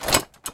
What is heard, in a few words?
armor